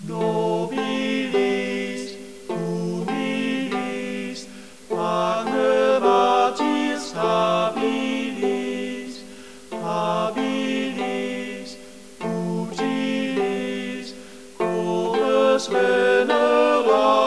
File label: Hymn; St Magnus